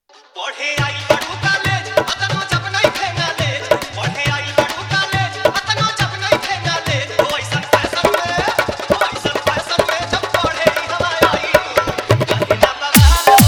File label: Bhojpuri Remix Song